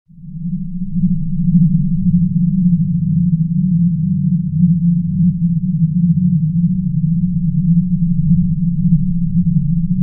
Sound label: space sound effects